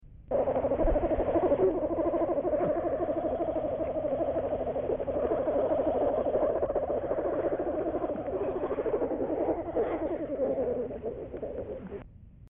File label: nursing, bear sounds